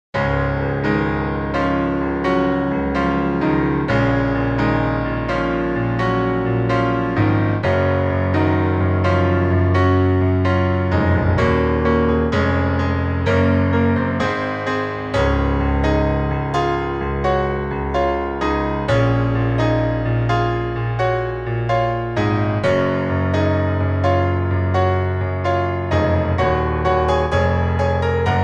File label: Montage Bright